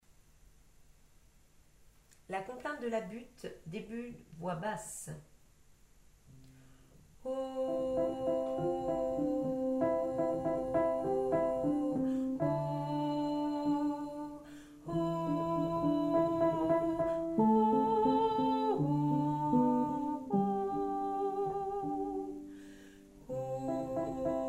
voix basse

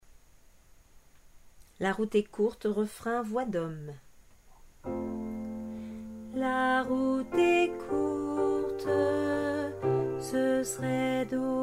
refrain hommes

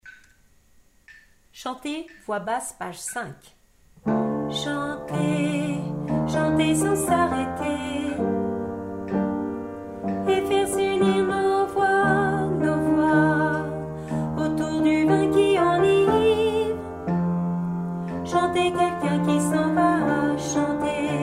chanter basse

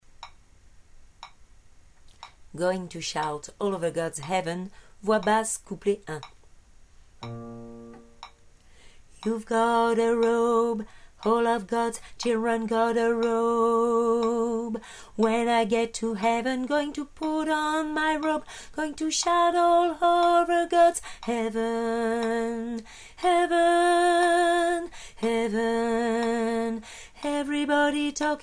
basse

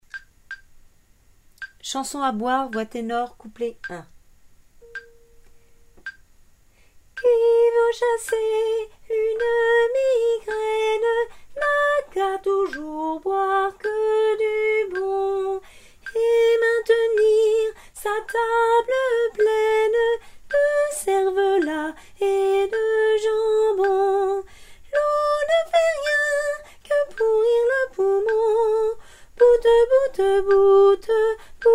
chanson a boire tenor

